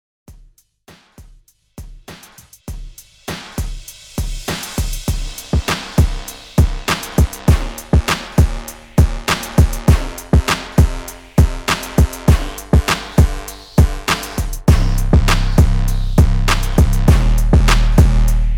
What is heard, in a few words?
TWERK 100 BPM; TWERK EDIT